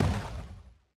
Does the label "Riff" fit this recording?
No